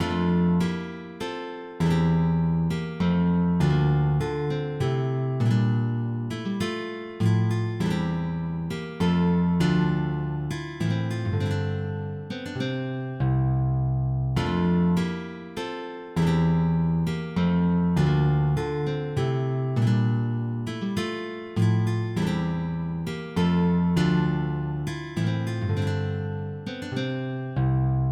Sarabande Duet; Dufaux

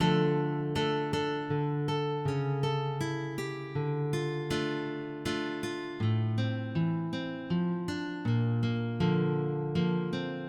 Sarabande, MIDI

